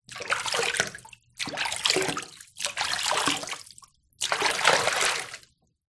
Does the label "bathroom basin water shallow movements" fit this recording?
Yes